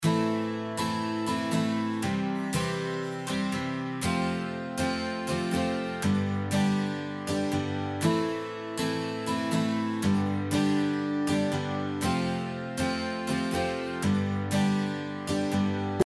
guitar

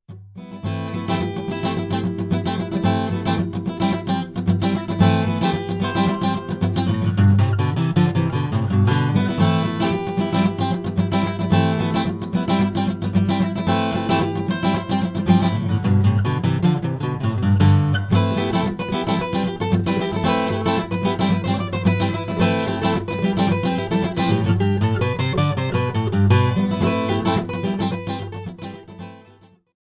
mute song

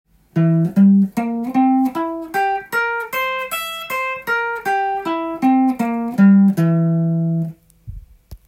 c7 chord tone, guitar

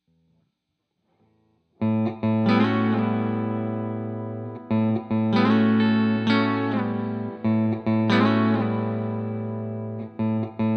soft guitar chord

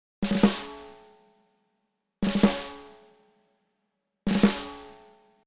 gost drums

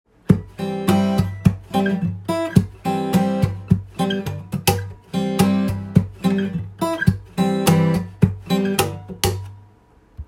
slap guitar

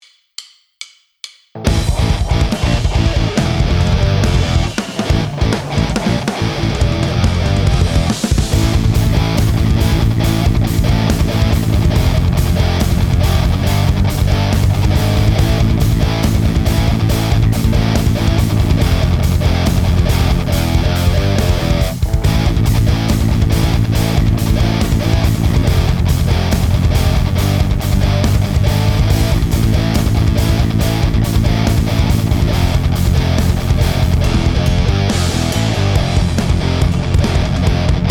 metal guitar karaoke

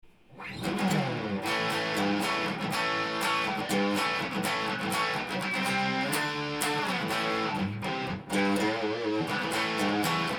guitar

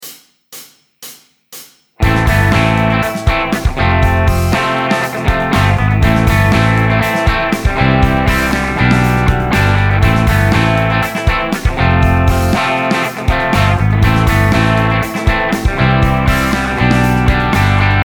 guitar solo

